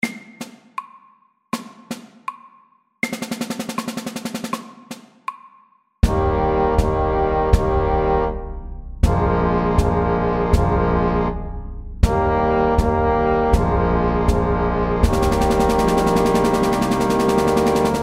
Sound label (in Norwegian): Minimarsj SLOW 80